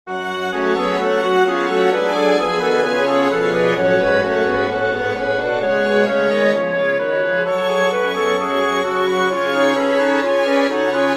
winds strings; Johann Sebastian Bach; omnes generationes from the magnificat major

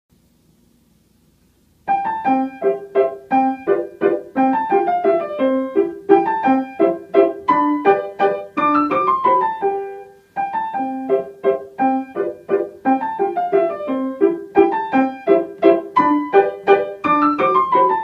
waltz; maikapar samuil moissejewitsch

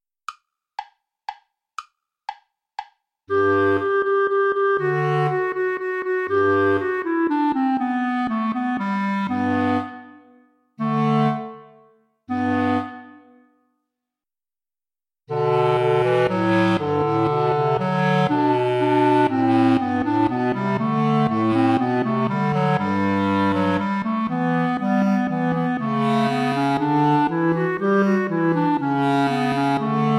play along without clarinet; alter ungarischer tanz

